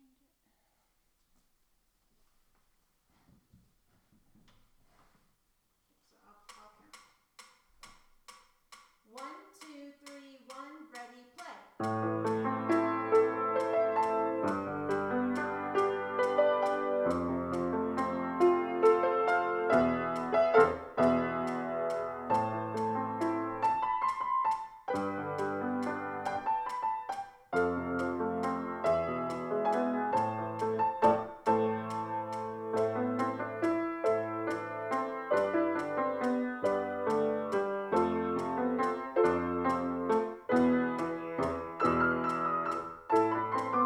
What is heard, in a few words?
Scenes from Barcelona Duet